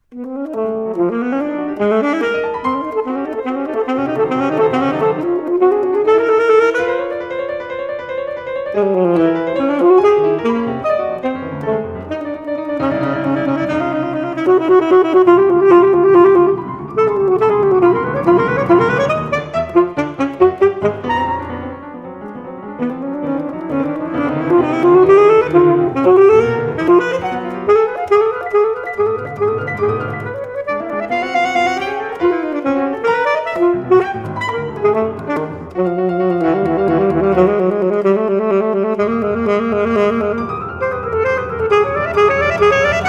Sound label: Alto Sax And Piano